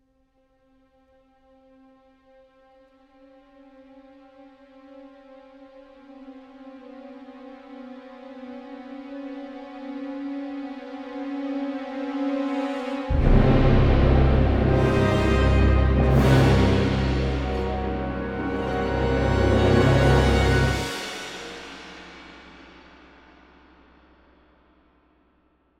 Invasion 80bpm 4-4meter

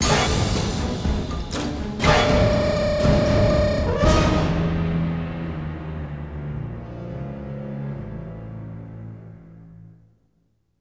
Bosscombat Lose; Bosscombat Ch06a Lose 01

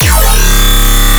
Bass Shriek 200 C#; exciplex sample presets pack; JinoBeats

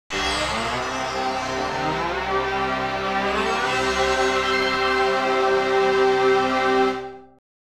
SEGA Saturn Startup; Bulk Slash; NTSC-J

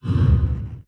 Fire Grate Ignite

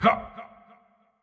Effort Tiny; Marv

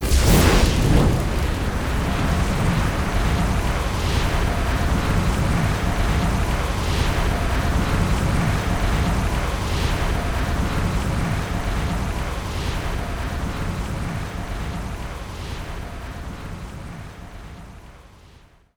Enemy Candle devil Strong attack Loop

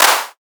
Clap

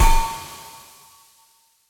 Mech Impact; Styx

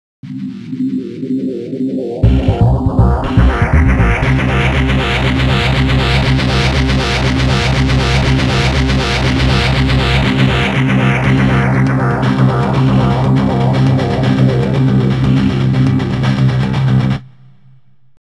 Unknow Jingle; Unknow Jingle Type 3

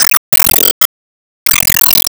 Meteor Fall

